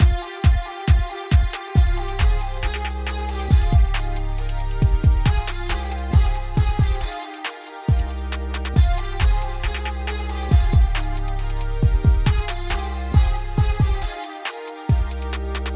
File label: Punky Trap 137bpm F minor; WeeLAB; 777 essentials bundle vol 1